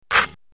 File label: Basketball Net Swish